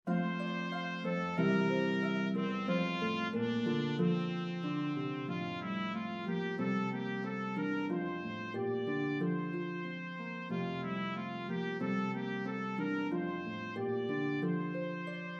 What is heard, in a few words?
Harp Melody Instrument Trumpet